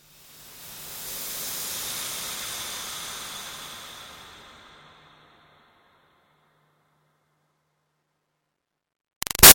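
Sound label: Swell Airy Toxin Steam Release Medium; Swells; Sound Design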